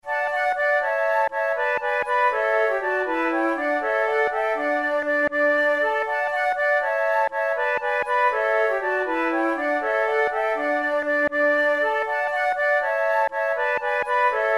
trio

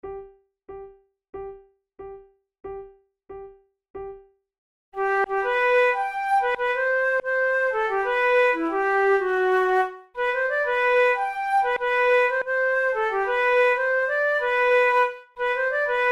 duet, flute; Oh merry row the bonnie bark

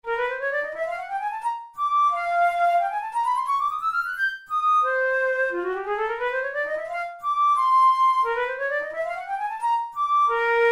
studies for the boehm flute; drouet 72

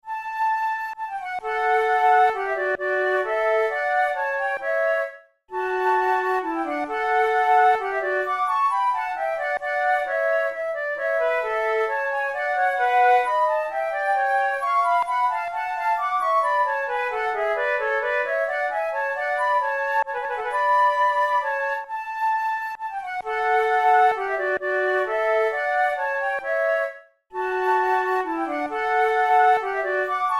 duos faciles menuetto, flute tunes; devienne

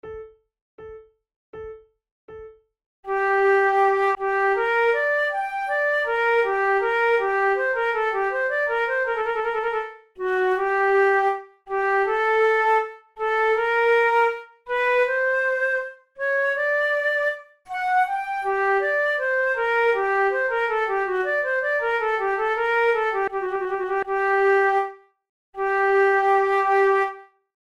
prelude, flute

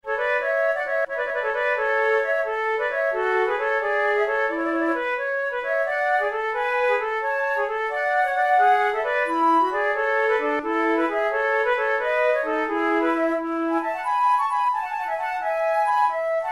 sonatas for two flutes, siciliana

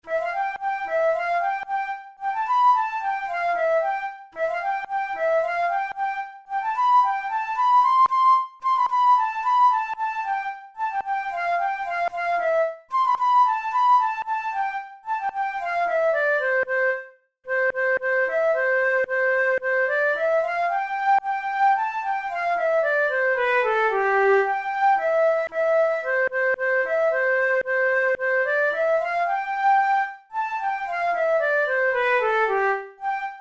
arre borriquito, flute

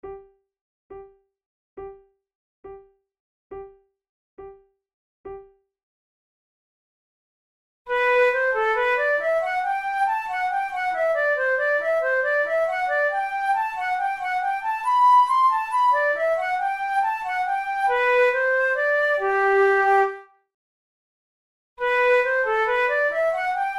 musette, flute; naudot; 6 babioles op10 no6

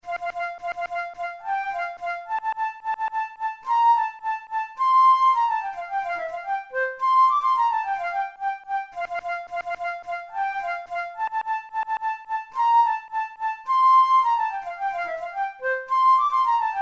marsch, flute

